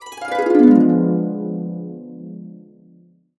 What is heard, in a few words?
D DIM7, Harps; USB Soundscan vol.62 - Complete Harps; AKAI